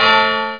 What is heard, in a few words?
Hit Wall Sound; Escape! 1.1